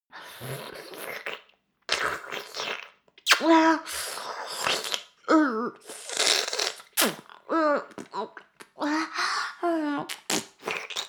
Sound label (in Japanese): フェラ音声; 少尉; 牧場戦腺